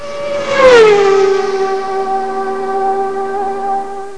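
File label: indy, racing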